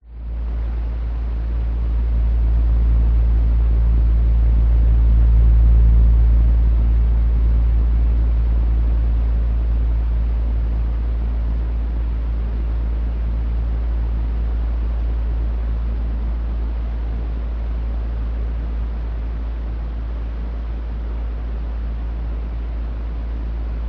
household fan operate

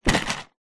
industrial tool box plastic set down on ground

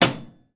household metal oven hob pan support drop on hob

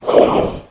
Demon; little robot sound factory

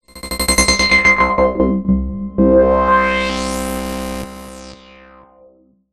sound design synth organ stutter delayed filtered; zapsplat

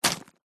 potato chips packet set down; food; 100g; zapsplat

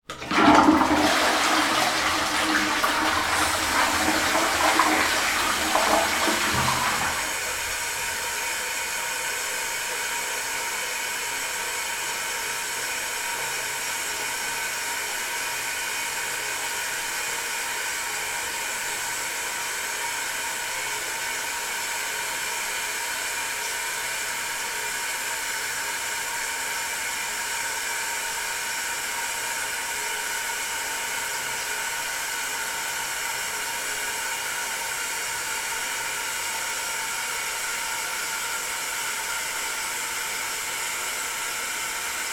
household toilet flush cistern; sound effects one

